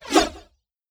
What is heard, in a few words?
blade 1 hand bound swing